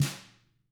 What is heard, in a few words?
tama hit; ILIO; Double Platinum Drums 1